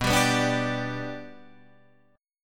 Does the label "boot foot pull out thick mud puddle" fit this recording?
No